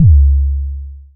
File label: analog tonal, kick; Ableton Foundation FreePacks